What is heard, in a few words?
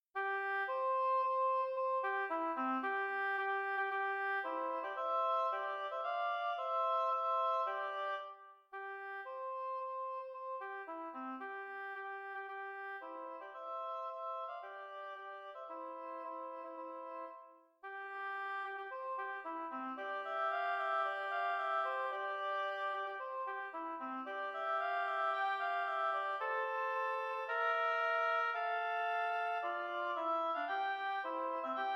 duet; henri kling